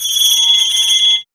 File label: BELLS